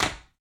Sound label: ceramic land; Golden Crayon Sounds